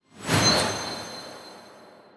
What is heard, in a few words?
tracks unlock notif counter